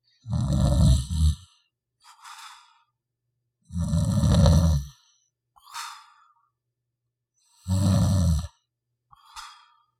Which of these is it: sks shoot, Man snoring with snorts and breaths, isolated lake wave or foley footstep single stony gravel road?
Man snoring with snorts and breaths